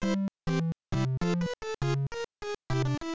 game over; super mario bros

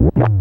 Yoshi Swallow; Wii

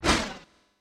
Beam Sword Whiff; Super Smash Bros

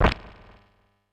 Stone Begin; Super Smash Bros; Kirby

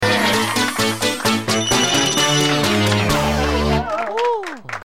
battle win with partner injured; Paper Mario 2 The Thousand Year Door

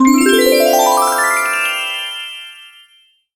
music shine appears; Super Mario Sunshine

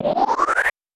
Beam; Samus; Super Smash Bros

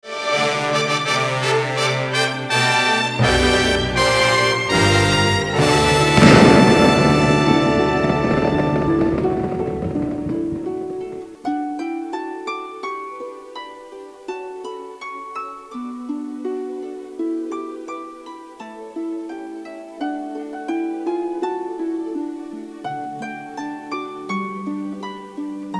Ending Victory; SM3DWorld Soundtrack VOL2

